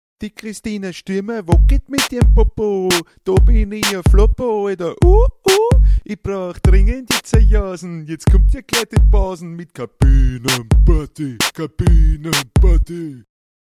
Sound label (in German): Parodie beat